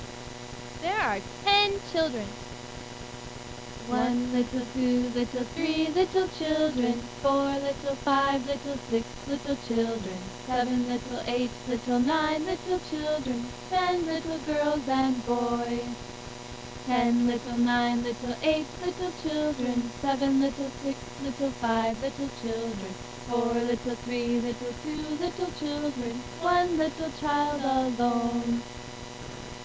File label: ten little children